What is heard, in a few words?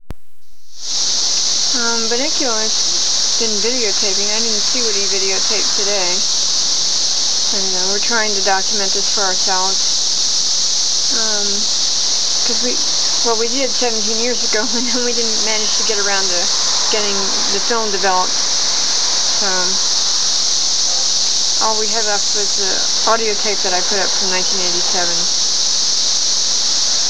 cicadas